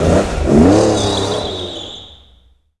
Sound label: CAR START, CARS